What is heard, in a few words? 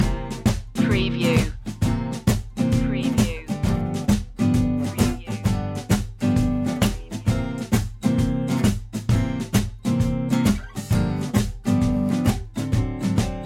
belly up blues loop